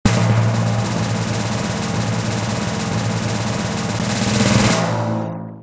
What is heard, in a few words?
Catapalt; Medieval Madness; pinball music